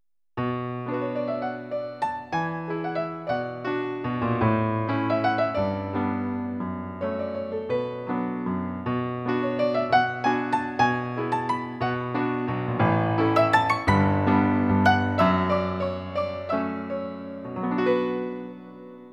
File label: Dance